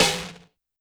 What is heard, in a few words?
Snare Break, YAMAHA RM1X